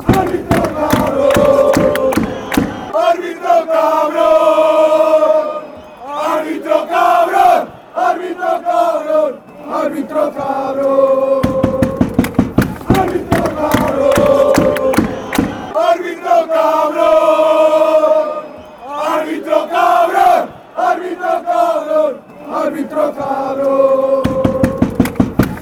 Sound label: idiot referee fan chants